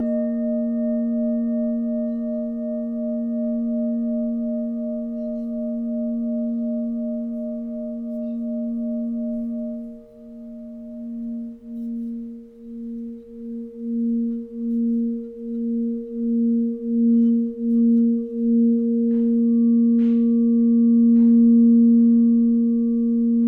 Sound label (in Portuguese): TACA As3, instrumentos de quartzo; escutar instrumentos de quartzo